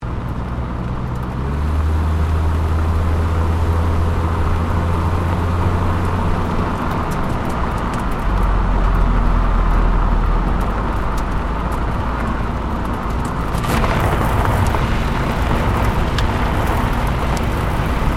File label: Car Toyota Corolla Drive Medium Exhaust Gravel Loop Stereo, hatchback; 2009 Toyota Corolla hatchback; Cars